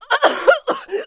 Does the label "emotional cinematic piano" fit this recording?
No